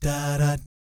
DAH DAT, Vocal Jazz FX; Spectrasonics; Vocal Planet; JAZZCOMBO

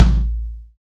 KIK, Real Kiks; Club-50 - Foundations Roland

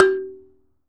PERC, Dance Mega Drums; 90 s Sample CDs; Best Service

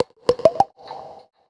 PERCS, Roots Funk; USB Soundscan vol.41; AKAI

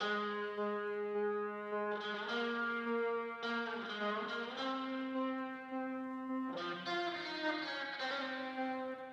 G#maj 105BPM Guitar; 99Sounds Music Loops; PANDORA BOX!; Yacht Week; Monosounds

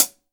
Hat closed; Akai MPC500; Hats TKit

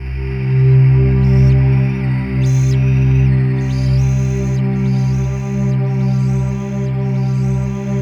chaos C3, ambient atmospheres

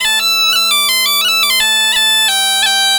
AM BP, Celtic Flavours, BAGPIP085; USB Soundscan vol.42; AKAI

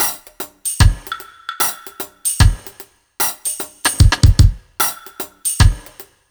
BT, Ragga & Dub Reggae

